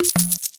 Menu Not Enough Coins

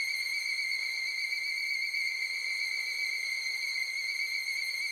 Helicopter Crash; Alone in the Dark; PS2; PS3; Wii; Xbox 360

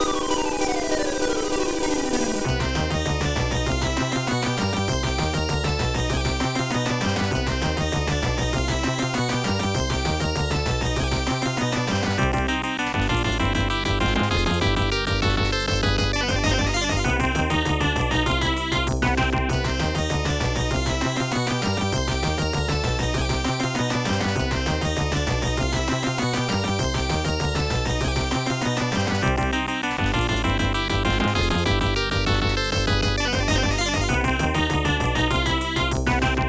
Ethnic Magician (Boss Area); prehistoric isle in 1930 arcade

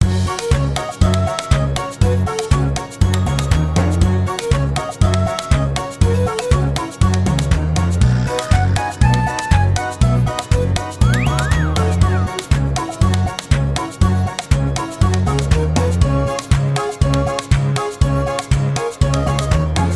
character story comical; bgm

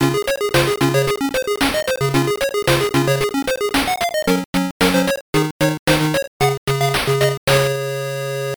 Game Start; Great Golf; master system

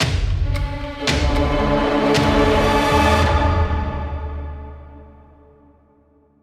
God Theme Victory; song of iron

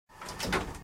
Elevator Door Close

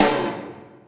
Combo Hit; Trapped - Ken Combo 2

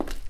footstep; Hunters and Props

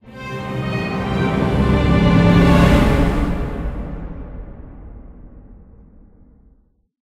Box Curse Stinger

